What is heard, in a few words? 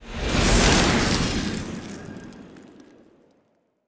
pro ship stinger move; biogenesis